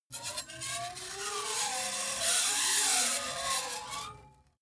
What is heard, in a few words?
metal screech phaser edgy